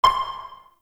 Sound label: Interior Orbital Station Battle Map Ping Close; Warframe; Tau